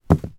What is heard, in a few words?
industrial paintbrush drop on carpeted floor